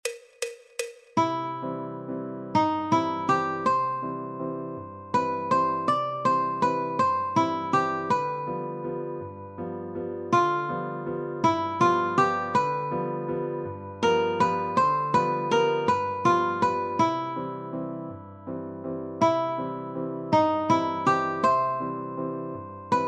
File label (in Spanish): Punteo de Ukelele Do Mayor; Sobre las Olas; Over the Waves; Tonalidad Fácil